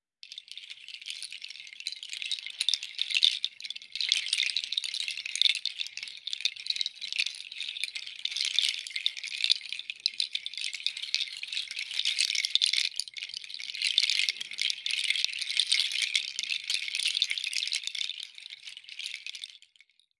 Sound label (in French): Noix chacha; le nid sonore